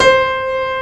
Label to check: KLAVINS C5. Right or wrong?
Right